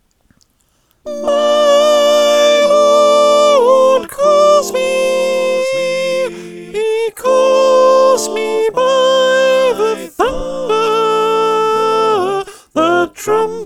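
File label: Soprano, choir; Steal Away v1&2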